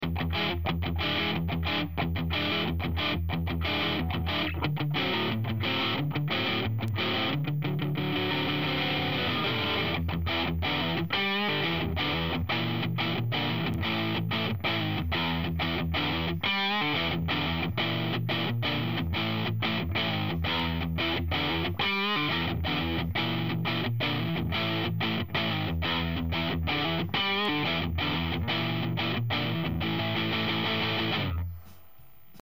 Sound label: Riff, epiphone lp 100, Frontman 212R; epiphone lp 100 eb; gitary elektryczne; gitara